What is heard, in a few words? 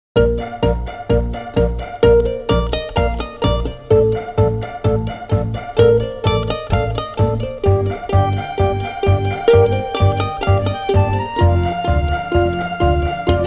UKE ORCH